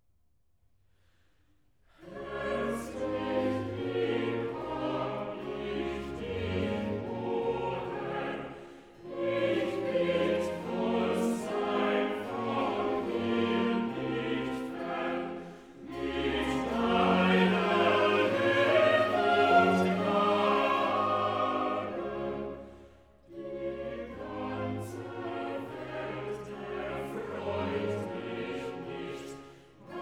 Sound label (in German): Choral; Cantata, BWV 174 'Ich liebe den Höchsten von ganzem Gemüte'; Bach; Long Melford; Whit Mon